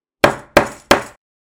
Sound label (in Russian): zvuk molotka